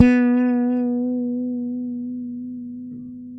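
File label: fret finger B, Pedulla bass; Masterbits CLIMAX 7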